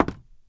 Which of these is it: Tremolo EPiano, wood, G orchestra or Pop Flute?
wood